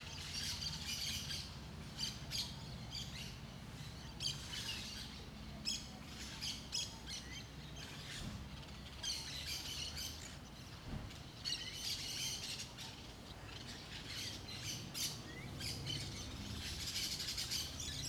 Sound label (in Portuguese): Ambiencia quintal de cidade pequena de dia com passaros, vassoura e carros; passando; ambiencia domestica